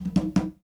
Bembe 6/8 Fills; Spectrasonics - Supreme Beats - World Dance

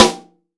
PICCOLO SN

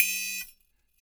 CYMBL; ILIO - Ethno Techno

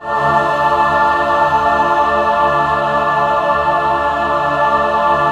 BIG MIN D4 L, BIG CHORD, Symphony of Voices

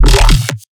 bass hit Growl Blast D#; Virtual Riot

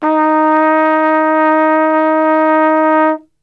D#2, Brass; Best Service; Brass Super Section

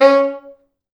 C#3, Pop Brass; Best Service ProSamples vol.19; AKAI

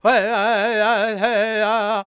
NA G# MIN, Vocal Planet World Voices LAKOTA; ILIO; Vocal Planet VOL-5

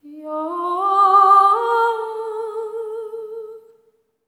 SERENE, Symphony of Voices; Spectrasonics

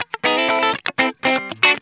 SCRUNCH, Funk Guitar; Zero G - Funk Guitar

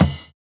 Kick, jungle breaks drum sounds

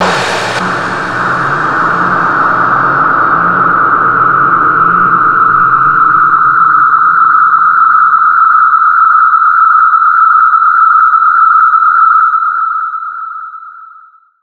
EXPLOSION; USB Soundscan vol.27

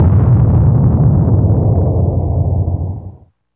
THUNDER HIT, Impacts, Hits & Booms; Distored Reality

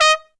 T D# Q, TRUMPET FX; Zero-G; Phantom Horns

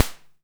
EH16 SD, Electro-Harmonix DRM-16

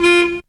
car fx; Zero G - Man Machine

